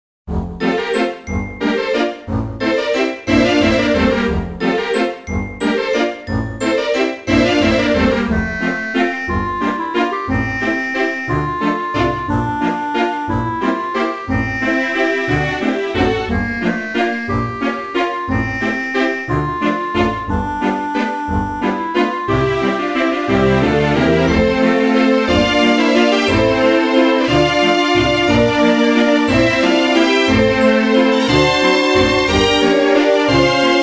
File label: piano; minecraft; Pokecops